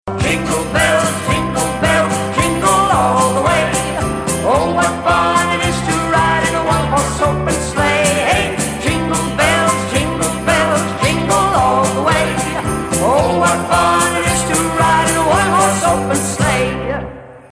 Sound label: Jingle Bells